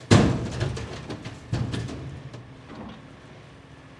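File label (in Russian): взрыв на стройплощадке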